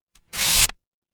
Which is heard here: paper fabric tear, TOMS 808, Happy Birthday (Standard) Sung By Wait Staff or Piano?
paper fabric tear